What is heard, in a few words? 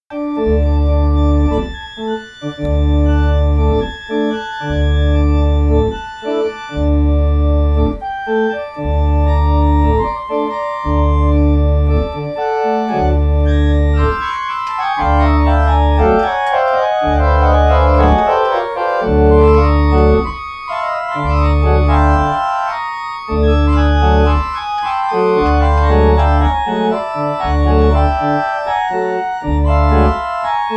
Bach Improv